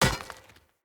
Short Sword Medium Impact Rock

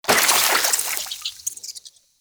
Infested Flower Burst; Warframe; Ambience; NineteenNinetyNine

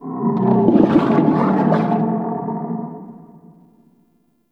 Inside Intestine Gurgle; Ambience; Bromius Inside

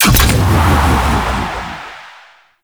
Void Dragon Jump Off; Enemies; Gameplay; Warframe; Duviri

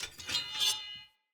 Cleaver Heavy Whoosh Wind Up Accent, Swords; Weapons; Player; Bromius Cleaver

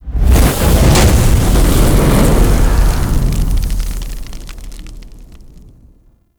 Arachnoid Camper Terra Fire Storm Activate; Warframe; Corpus; Abilities

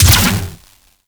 Rifle Proj Explosion; Warframe; Weapons; Wisp Rifle

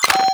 Pager Open; Warframe; Arthur

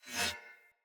Saw Dagger Whoosh Accent; Weapons; Player; Swords

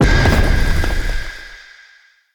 Dodge Trap Burst; Enemy; Abilities; Characters; Circade

